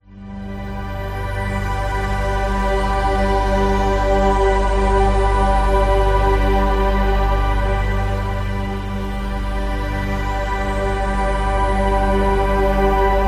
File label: Meditation; soundscape